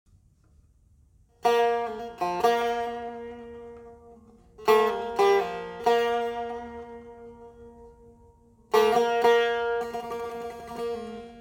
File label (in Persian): صدای ساز سه تار; با-مهر-احسان-آقایی